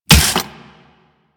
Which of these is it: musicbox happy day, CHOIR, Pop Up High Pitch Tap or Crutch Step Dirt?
Crutch Step Dirt